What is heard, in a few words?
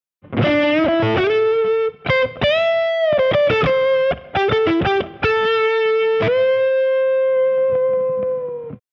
lick